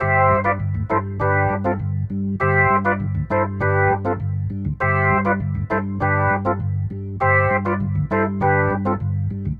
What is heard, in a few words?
Rotor Riff, Organ; Sonic Foundry (Sony Creative Software); Joe Vitale Organ Donor